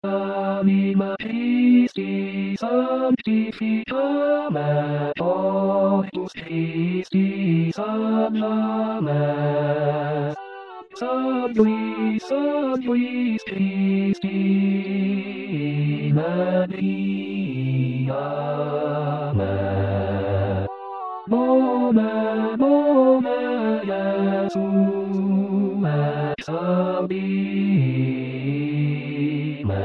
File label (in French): basse; anima christi